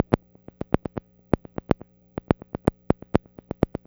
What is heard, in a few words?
Click Trick, Modular Electronica; Beats; Sonic Foundry (Sony Creative Software)